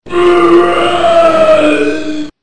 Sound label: bwauugh